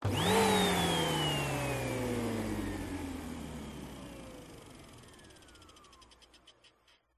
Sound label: household vacuum cleaner power on off short